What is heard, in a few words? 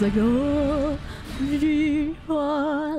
back singers; elden ring